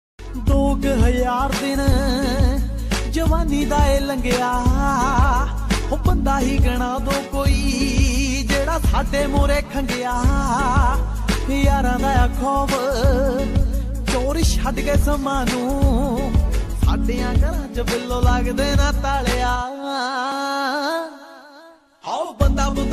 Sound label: Dance Punjabi Ringtone